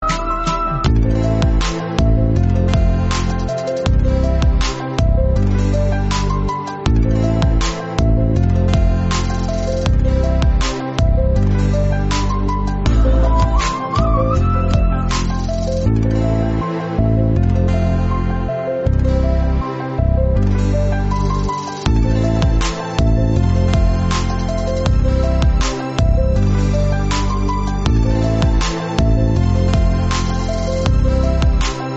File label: instrumental